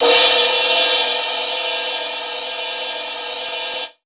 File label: CYMB; Houseworx; Drums; Cymbal